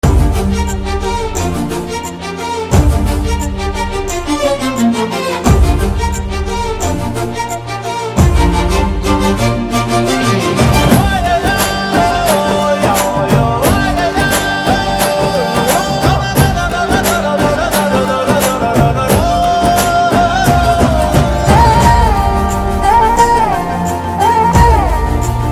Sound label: song music tamil; telugu